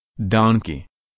donkey

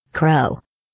crow

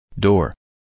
door

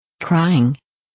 crying

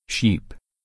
sheep

